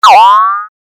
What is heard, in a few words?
good pain; CAC ASSETS